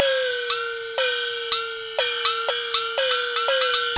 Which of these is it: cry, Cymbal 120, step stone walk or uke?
Cymbal 120